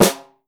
snare

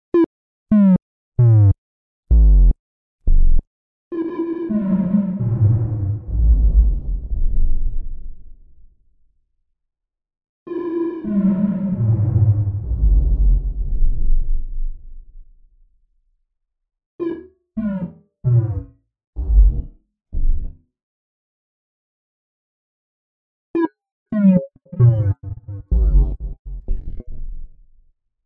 reverb

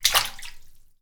splash

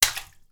splash

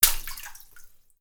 splash

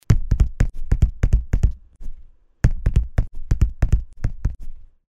kick

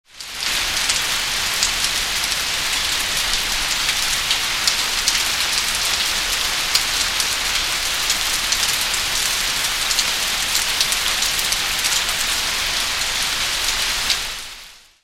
rain

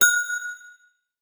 bell

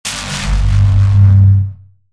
laser

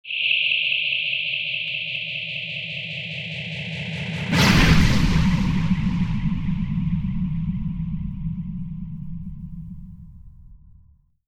shock

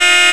beep

